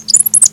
Bird; FAIRLIGHT CMI IIX

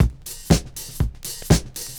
120 VINYL, DRUMS

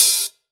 HH, Drum Mashines; EdgeSounds

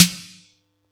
Yamaha EX-5

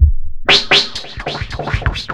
FILTER, Big Beat Drumloops

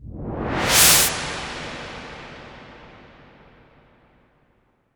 IMP WIPE, Cinematic Impact

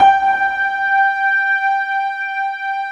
ISLAND PN

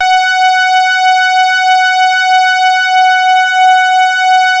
SAW, Synth lead; InVision Interactive; Keith Emerson; The Most Dangerous Synth and Organ

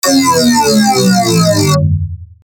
3xosc test, bass

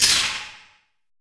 TWACK ECHO, FILM PERC; Drums In Your FACE!

